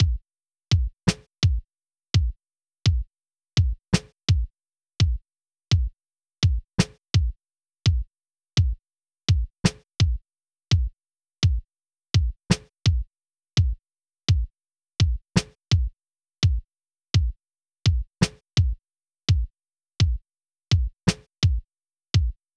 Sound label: drums